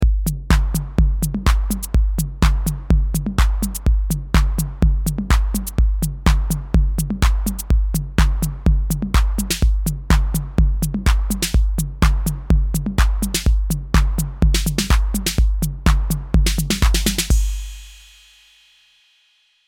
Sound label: 808 clone; synth-diy